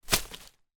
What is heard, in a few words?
stick branch fall on forest floor; foley